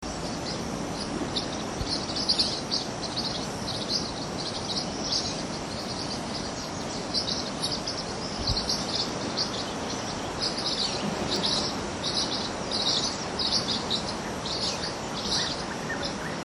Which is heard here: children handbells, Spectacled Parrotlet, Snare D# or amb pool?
Spectacled Parrotlet